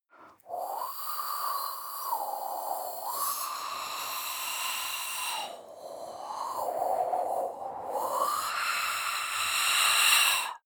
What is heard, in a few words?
breath female eerie wind wah